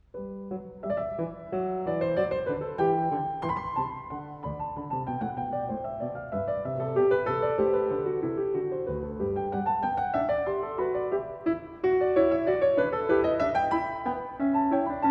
Goldberg Variations Var. 2. a 1 Clav; Bach; BWV 988; Live at Carnegie Hall, New York, 2025

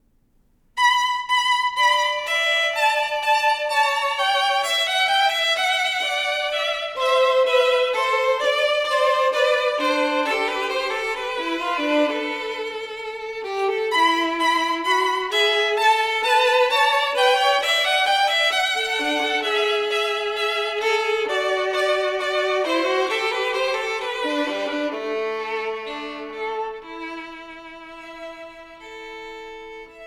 Duos for Two Violins, Hungarian Song

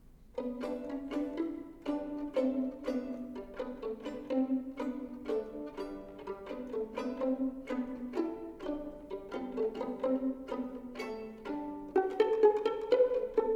Duos for Two Violins, Pizzicato

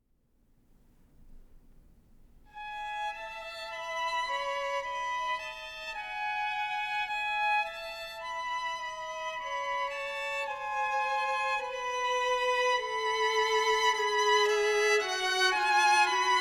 Duos for Two Violins, Teasing Song

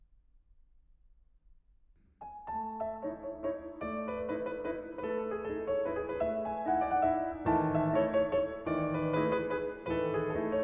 Leaping Dance, Piano; 6 Norwegian Mountain Melodies, EG 108 I.; from Numedal; Grieg Complete Piano Works

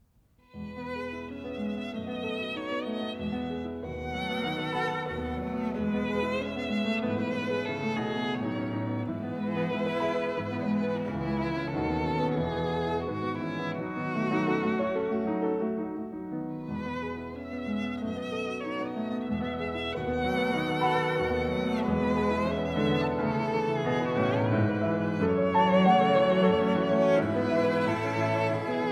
Piano Trio in A Minor II. Variation 1

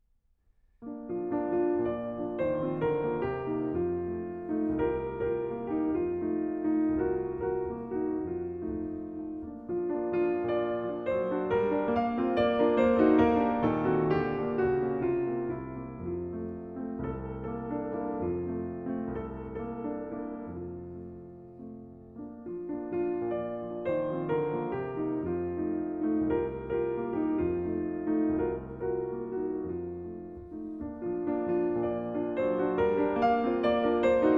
Allegro Cantabile, Piano; Poetic Tone-Pictures, Op. 3 II.; Grieg Complete Piano Works

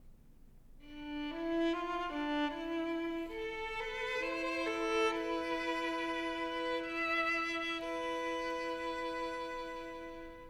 Duos for Two Violins, Slovakian Song

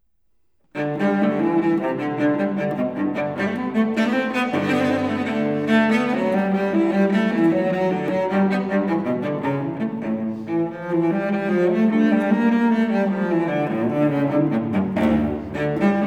Gigue; Torleif Thedéen; Bach, J.S. 6 Suites for Solo Cello, Bwv 1007-1012

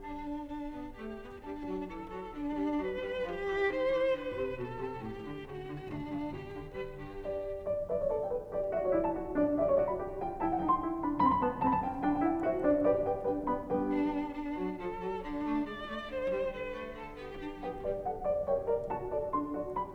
in G Major, Cello; 10 Variations on Ich bin der Schneider Kakadu; Op. 121a Variation VIII

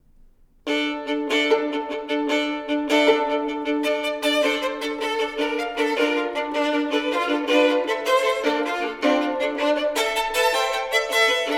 Duos for Two Violins, Dance from Máramaros

